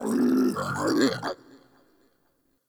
Cannonball Thrust Vocal; Powers; Bromius

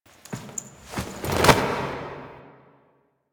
Foley B; Warframe; The New War; Zariman Void Kid To Drifter Reveal

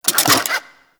Necramech Repair Drone Claw Calibrate; Enemies; Warframe

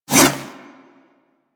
Kunai Whoosh; Stalker Kunai

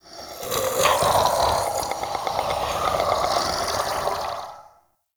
Mockery Male Vocal Idle; Characters; Enemy; Vocals; Mockeries

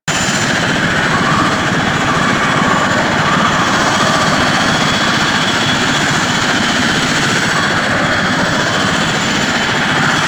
Movement Engine Loop; Warframe; Vehicles; Archwing Demolition

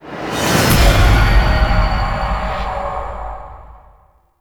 Floor Enter Stinger; Warframe; Tau; Circles Of Hell

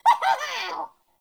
Sprout Vocal Grumpy; Friendly; Sproutfolk; Fey Hub Sprout

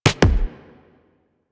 Weapon Punch Double

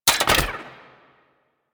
Spear Gun Mech, Weapons; Warframe; Corpus

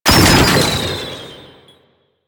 Proto Infested Mutalist Proj Fire; Warframe; Abilities

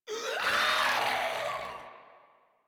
Infested Runner Explosion Warning; Warframe; Runners

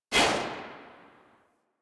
Rifle Shot C; Weapons; Warframe; Tenno; Bard Rifle

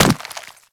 Infested Runner Step Default; Warframe

